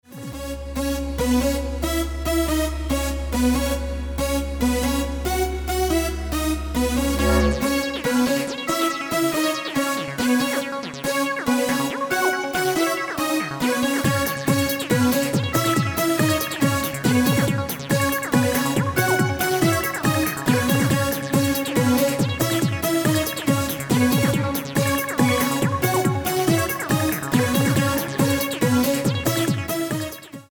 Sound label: Instrumental